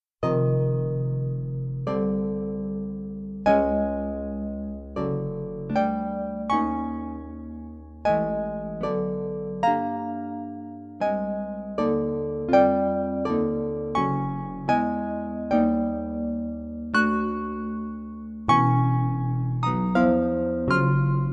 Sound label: Arpa